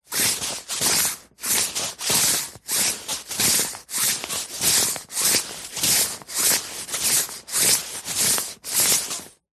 clothes jacket thick high vis walking slow movement